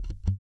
VALLEY FUNK, Bass; Spectrasonics; Bass Legends

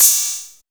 D&B Hat; neuro

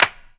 CLAPS HIP, CLP; Best Service Dance Mega Drums

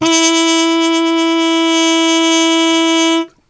E 2, Brass; Best Service; Brass Super Section; TRP HARMUTE; 90 s Sample CDs

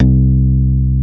SLICK BASS C3; East West - Global Sounds

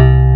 BELL C2; Big Fish Audio; Synth City

